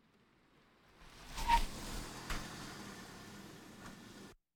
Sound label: Automobiles various